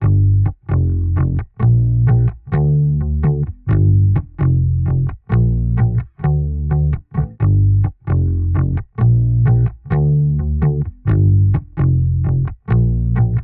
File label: Bass